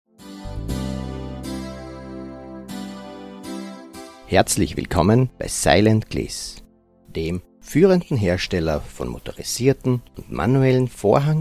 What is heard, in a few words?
sample silent gliss